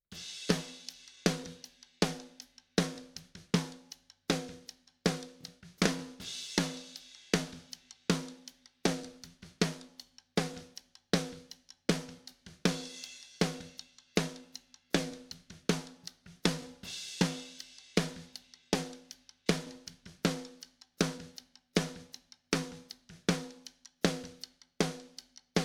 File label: snare